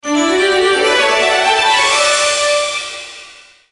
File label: Music Win; Littlest Pet Shop 3 Blue Team Pink Team Purple Team; NDS